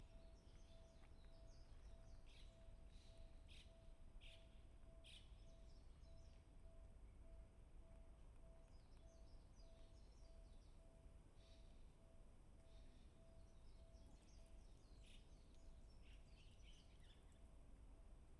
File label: Binaural, walk